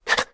thinking; Scrat

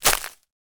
foley footstep single dried long grass

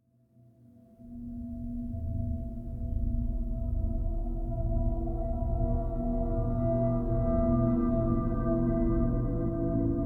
underground ambient, music, mystery; You Are Empty

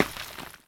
dirt

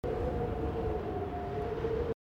Tool Gun; Fleeing The Comples

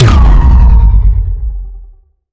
Shot Large Laser Ion Fusion

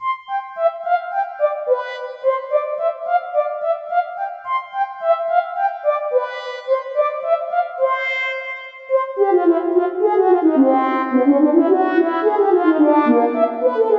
stems organ; Hot Lava; Hot Potato

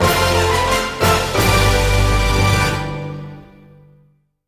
Upgrade Sting; angry birds transformers 2014 ios

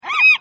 beast sound; Caravan Beast

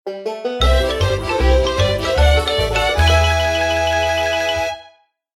Chicken Coup High Score

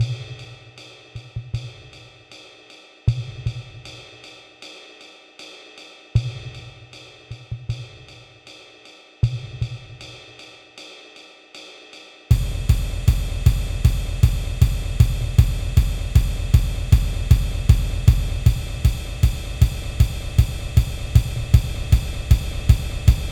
End Build DRUMS; transistor

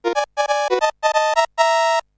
jingle ~ time running out warning

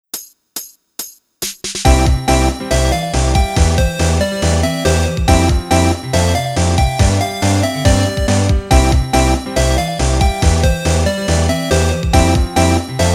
Simple Folk; Gyakuten Saiban Sound Box

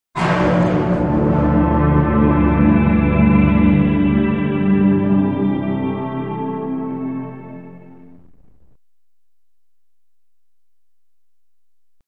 Quest Failure

